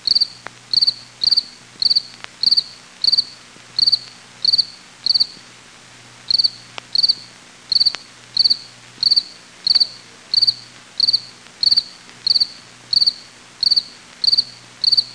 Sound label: cricket